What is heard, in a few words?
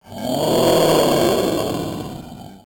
Retro Roar LoFi